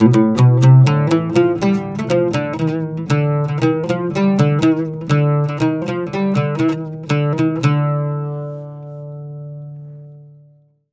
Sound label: 120 OUD, Mediteranean Traditions